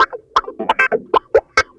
Clavinet; Ambient Grooves

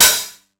909 OHH; Wizoo; Platinum 24 Electronic; HHAT

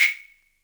wood, Univox SR-95

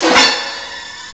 ORCHE; CMI Sound Library Rev 1.3; STRINGS

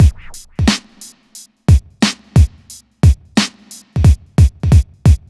beat, hip hop

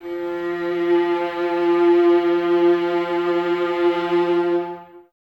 violas F 3, String Ensembles, Exprsive; Miroslav Vitous

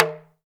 TALK DRM, Heart of Africa; Spectrasonics

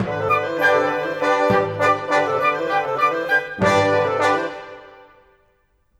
Brass, Winds Percussion, Orchestral; Sonic Foundry (Sony Creative Software); Orchestral VOL-2

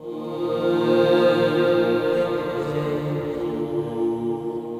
Nun Vox, Voice; Sonic Foundry (Sony Creative Software) - Friendly Fire; DroneLoop

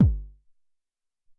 House Kik, Alesis SR-18

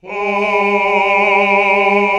Basses

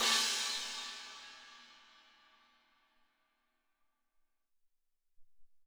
Amb Chna, Alesis SR-18

